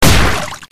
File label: blood